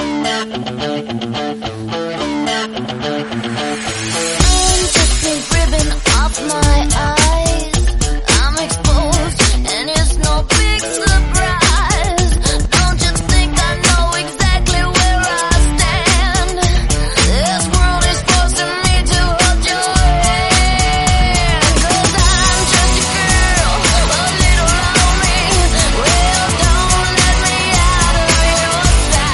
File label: Snippet 108bpm D